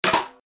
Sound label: Spin Stop, slots; Ancient Scrolls